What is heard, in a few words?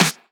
Clap; Vengeance Samples